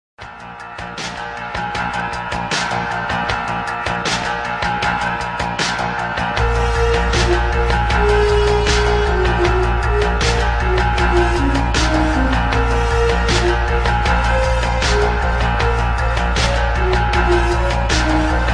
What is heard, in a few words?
instrumental